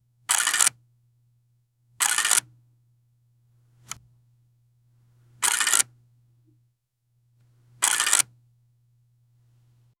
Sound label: cool camera movement